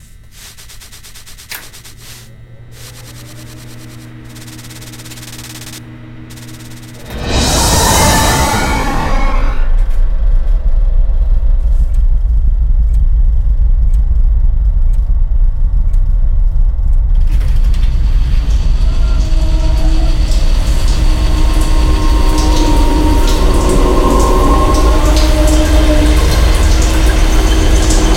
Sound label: Pads; Muzik 4 Machines; Website Intro